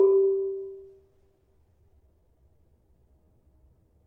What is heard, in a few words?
Barung 4 G, gravity harps tuned